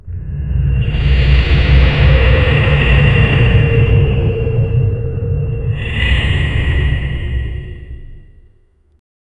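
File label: golem roar combining stone; cavern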